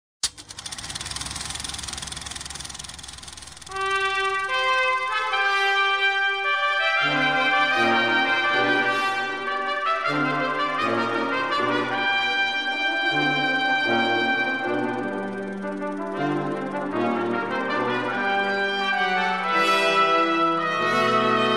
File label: brass